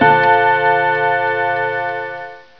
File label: anzio bell